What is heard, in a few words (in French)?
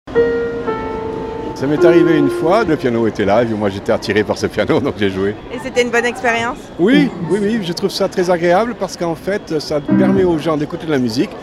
son piano en gares